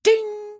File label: ding